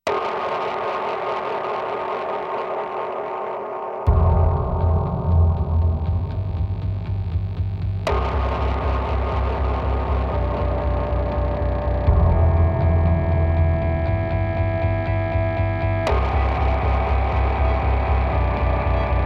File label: no drum kit; immediate music